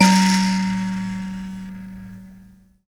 BUZZ, Buzz Kalimba; Roland LCDP11 Africa VOL-1

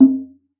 Conga; ProSamples vol.42 - Session Instruments

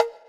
Wood, Block Stick; Equipped Music; Loungin House

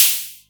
HatO, Roland MC09; Drums

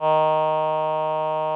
VP-330, String Machines

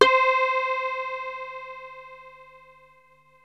BASSWEEP; MORPHEUS

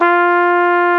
FLUGEL F4, WIND; AKAI S-Series CD-ROM Sound Library VOL-2

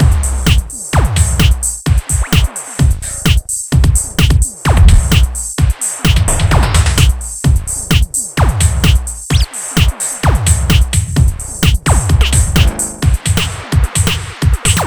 Beat, Drums, Eclectic Electronica

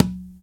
Conga Battery, EMU E-III; Samples vol.55 - Retro Sampler

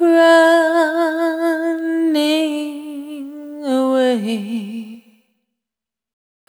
Single Voice Melodic Loops

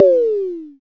FM Perc; Rap

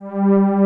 MO ST G3, ANA STR

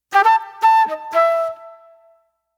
Soul Plate Flute

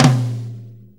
6-8 Tom Flam; Drum Tools; Sonic Foundry (Sony Creative Software); Loops & Samples Drum Tools; Flams

